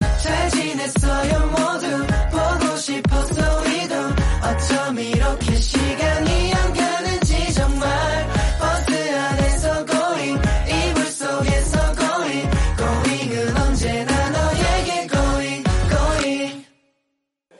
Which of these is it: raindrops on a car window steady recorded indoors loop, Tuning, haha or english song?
english song